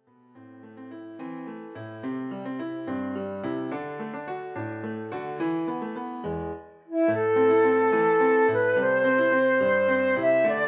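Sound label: The House of the Rising Sun, flute; Klavierbegleitung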